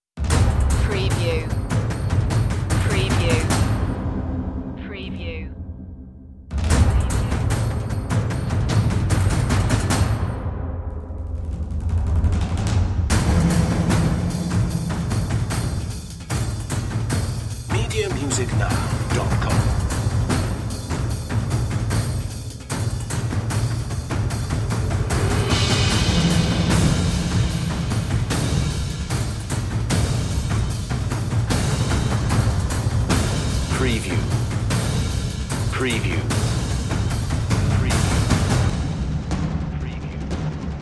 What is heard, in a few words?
percussion